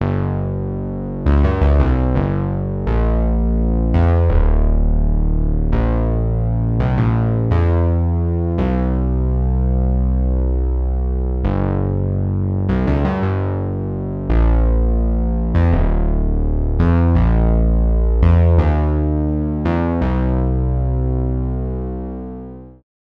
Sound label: bass sh101; roygbiv